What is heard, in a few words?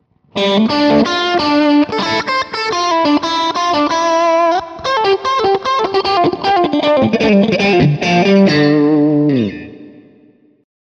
Fender AM Orig. 60 Middle and Bridge Crunch